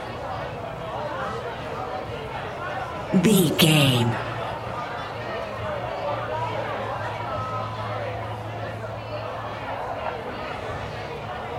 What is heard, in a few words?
background crowd people chatter loop